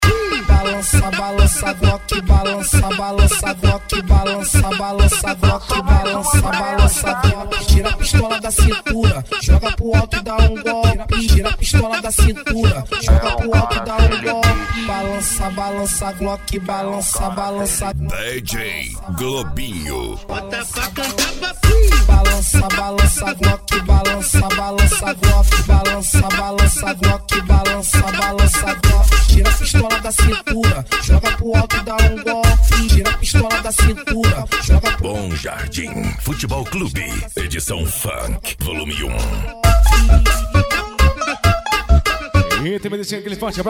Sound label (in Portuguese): funk pisadinha sertanejo